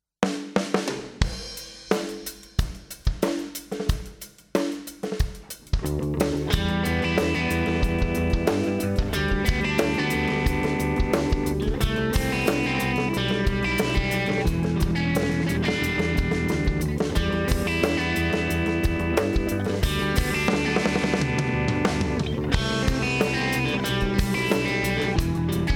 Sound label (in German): Gitarre Aroused